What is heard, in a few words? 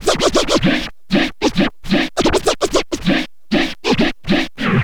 scratch short, Vinyl scratches